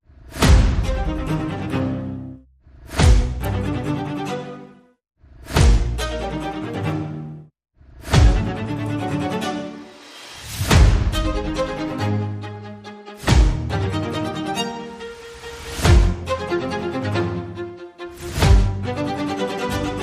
Friendly March No Tickies

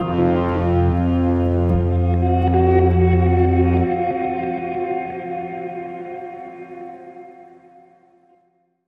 Link Sting

